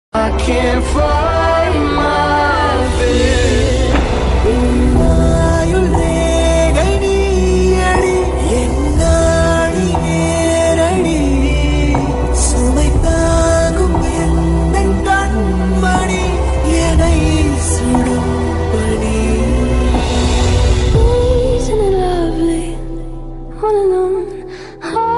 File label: remix song ringtone english x tamil dj ringtone